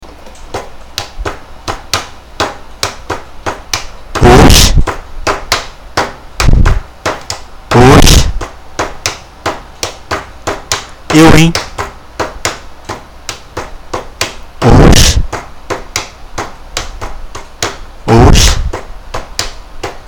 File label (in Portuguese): rap do oxe